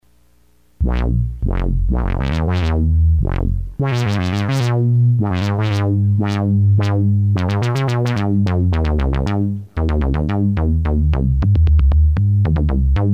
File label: 800 bass, ADSR ENVELOPE; synthtech; motm 800